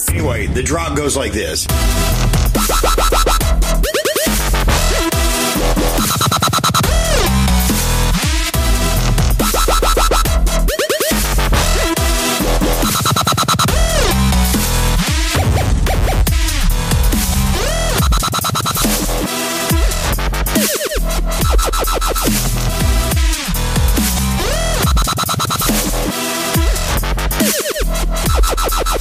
Dubstep From ROMANIA

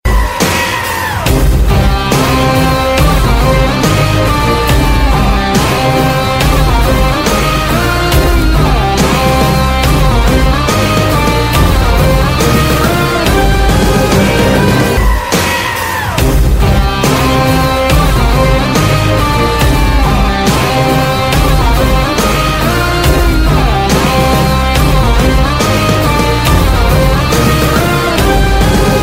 Horror

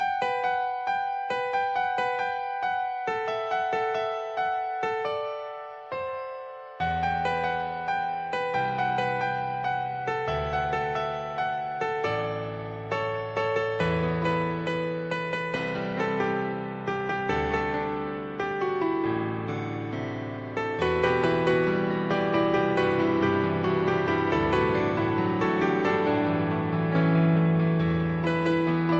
ANIME INSTRU MIX

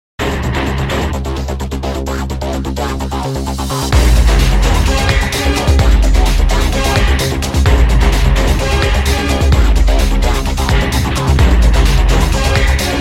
msg tone; nfs; electronic

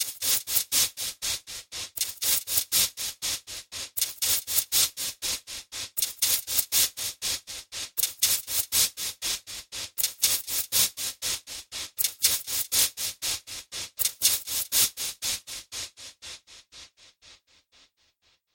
Echo Signals

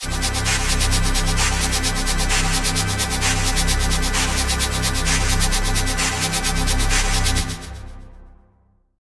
Dance Arpeggio

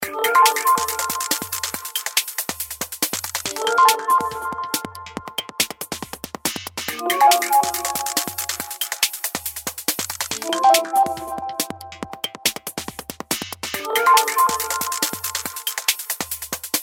synthesizer music

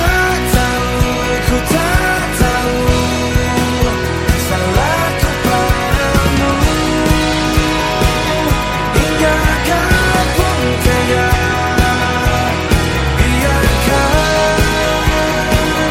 Is Calling Acoustic

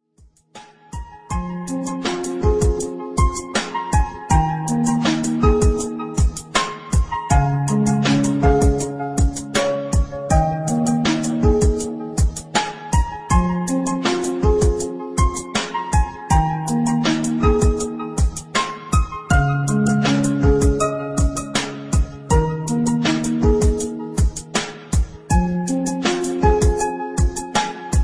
sad piano

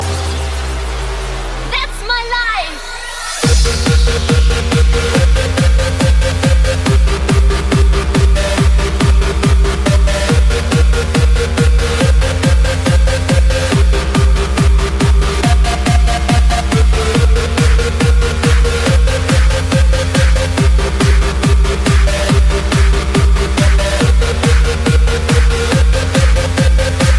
dj music, hip hop ringtone

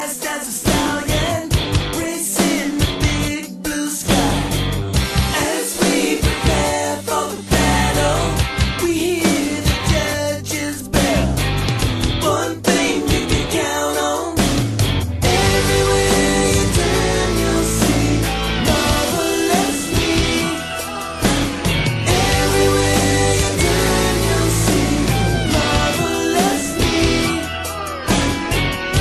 Is Calling RNB

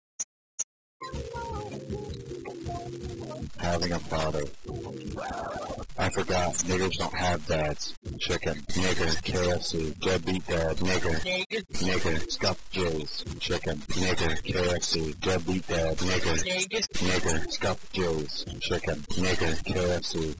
hyper distorted version; harry potter theme

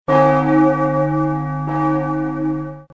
churchs bell ring; christian

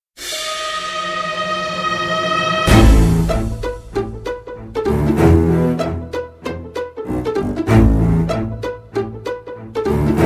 Reggaeton Beat

